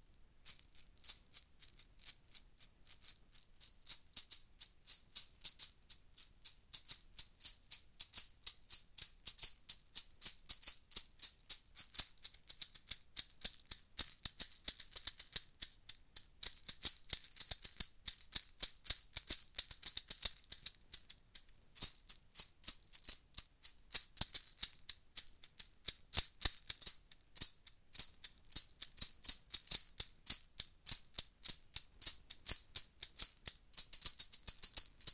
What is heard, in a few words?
single shaker